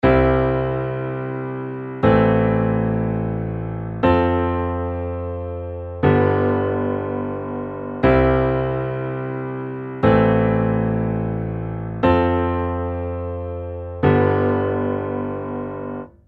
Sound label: Only Chords; Toontrack EZKeys